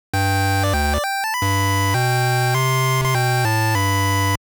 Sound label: Game Start; Guttang Gottong; Konami GB Collection